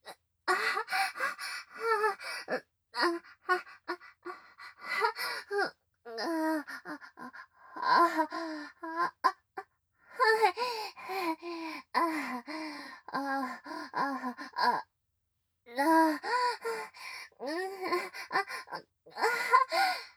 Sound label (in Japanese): 喘ぎ